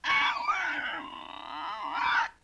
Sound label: LoFi Synthetic Squawk; Vintage Cartoon; Comedy Vocals